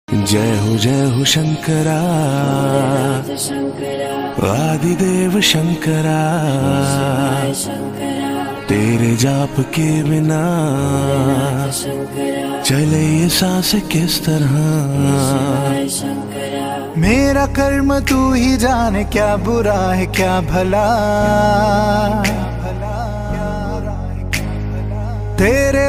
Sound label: ringtone, mahadev ringtone, flute ringtone